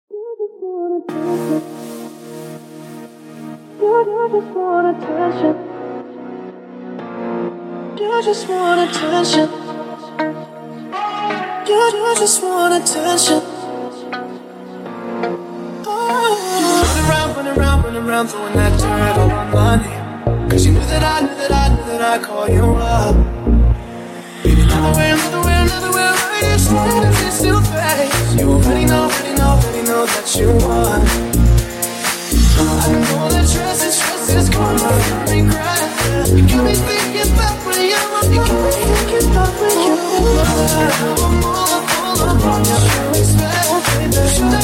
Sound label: dance